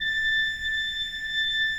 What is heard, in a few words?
A5, Cathedral Organ, ROHRFLOETE; Propeller Island